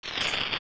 Skullwalltula Turn; Zelda; OOT

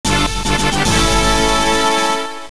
Fanfare Small Item; Get Small Item fanfare (updated with better quality) (Soundtrack rip - not heard in game!)

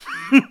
Hmph; Yuga: "Hmph!" (kicking Link); Zelda

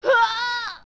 Yell; Sheik

